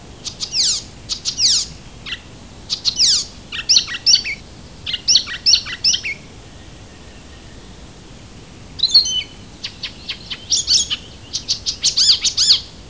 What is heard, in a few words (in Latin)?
Hippolais icterina; Пересмешка зеленая